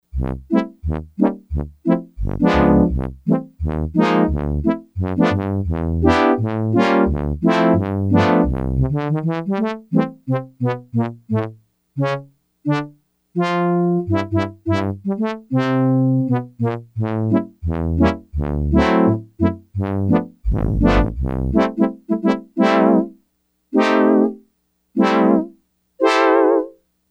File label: Horns, Yamaha DX100; Factory Patches